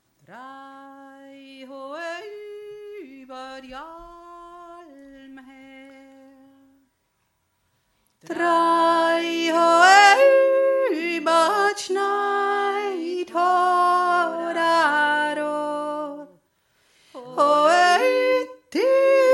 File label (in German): obere stimme; drei hoe ber d olm